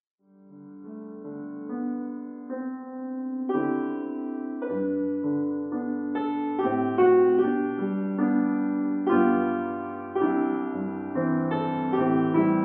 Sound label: I Need Thee Every Hour Piano